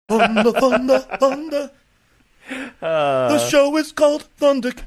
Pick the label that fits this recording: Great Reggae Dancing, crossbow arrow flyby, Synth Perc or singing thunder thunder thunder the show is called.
singing thunder thunder thunder the show is called